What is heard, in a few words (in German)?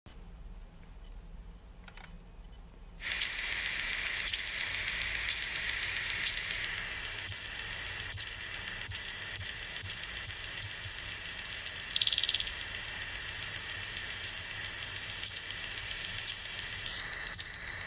fledermausruf